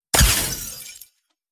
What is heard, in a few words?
Shield Sound Effect; Fortnite